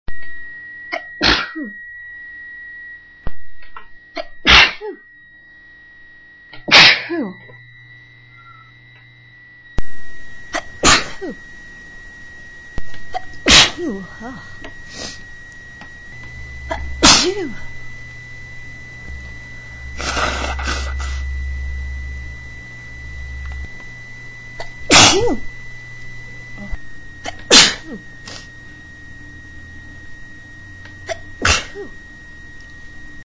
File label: big ones, female